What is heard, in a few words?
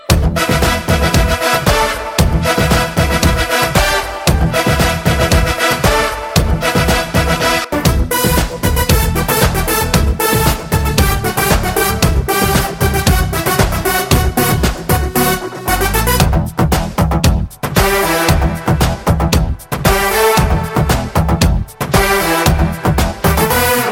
Remix Instrumental BG